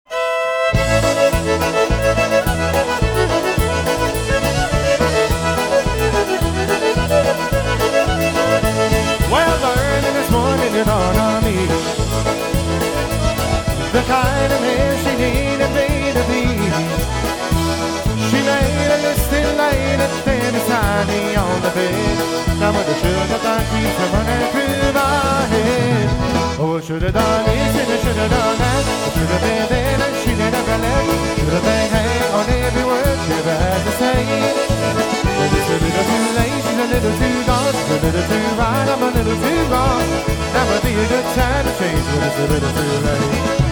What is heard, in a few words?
Polka, Live Jam